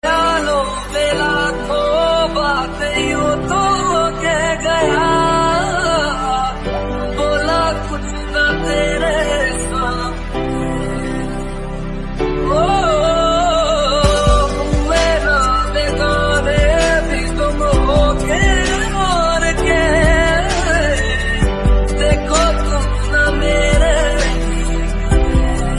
love hindi song tone ringtone; bollywood ringtones

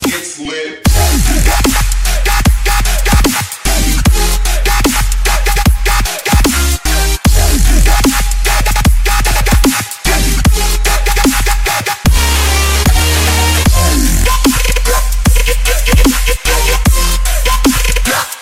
dub step ringtone

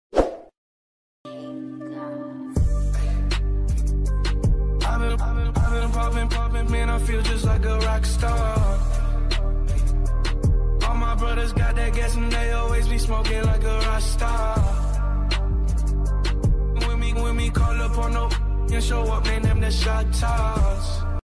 latest english ringtone

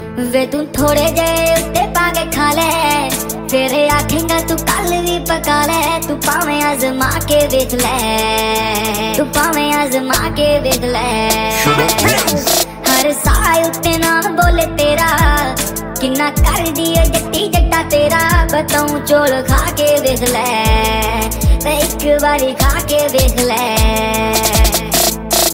funny version punjabi ringtone

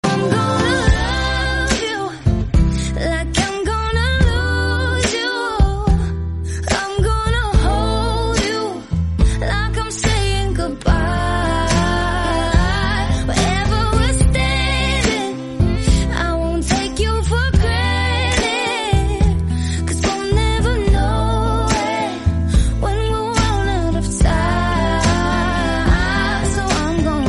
sad english song tone ringtone

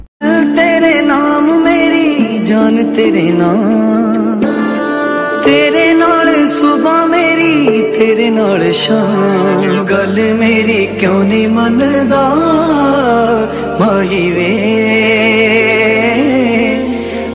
bollywood sweet ringtone

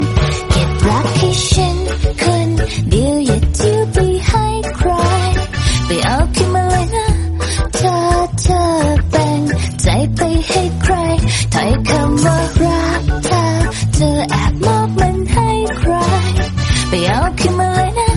english song tone ringtone

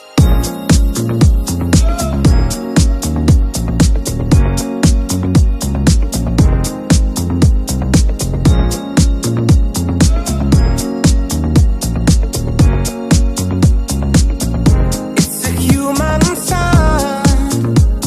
english ringtone

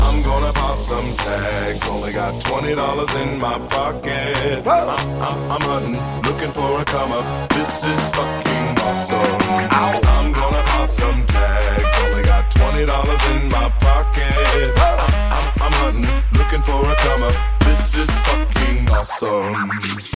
english song tone ringtone; english ringtones

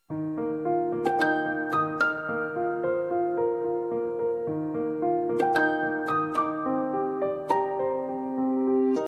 soft soft sms tone ringtone

